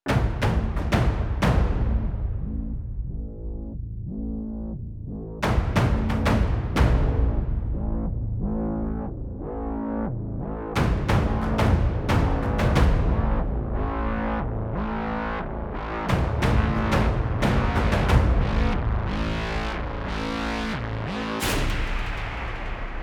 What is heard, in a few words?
City Combat Loop